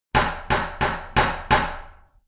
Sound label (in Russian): udari molotkom po dveri